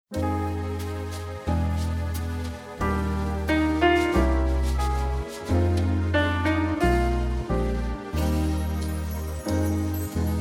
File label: Performance, Trumpet; strommen instrumental